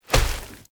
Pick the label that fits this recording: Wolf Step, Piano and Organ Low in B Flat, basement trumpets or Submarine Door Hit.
Wolf Step